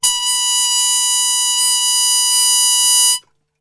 B 2, Brass; Best Service; Brass Super Section; TRP HARMUTE; 90 s Sample CDs

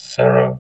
VOX VOX, Electro Vox

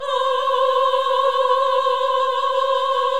VIB F C5A -L, Symphony of Voices; Spectrasonics

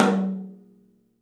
TOM, Studio Toms; 3D Percussives

